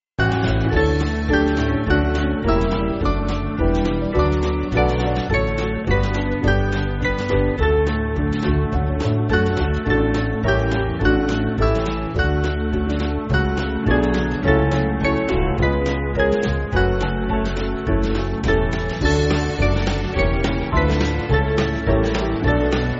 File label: Band; We Pray Thee